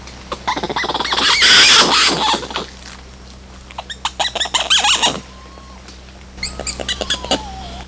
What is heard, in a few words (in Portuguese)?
muriqui